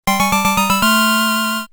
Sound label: victory